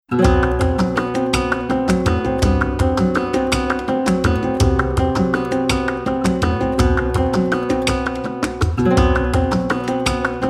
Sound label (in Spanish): Tanguillos plus guitar